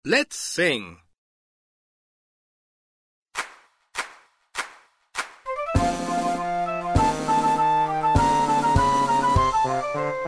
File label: Song Clap